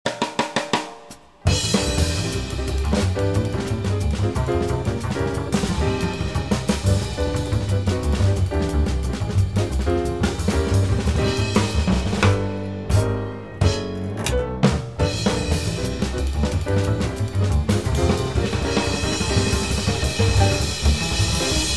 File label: minus melody